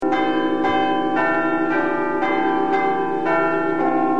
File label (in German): beiern; lengerich